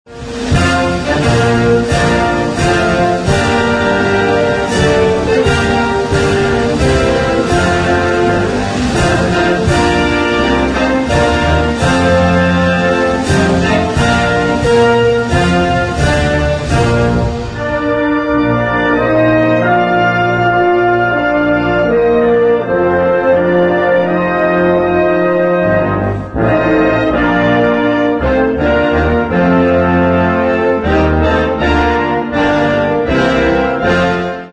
usa national anthem the star spangled banner instrumental